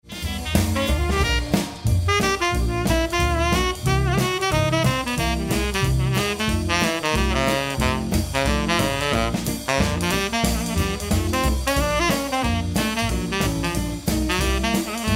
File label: sax, jazz